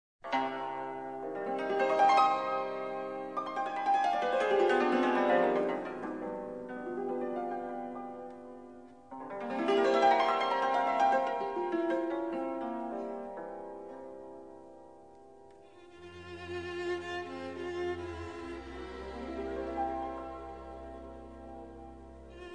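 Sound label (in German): cymbal klänge; lehar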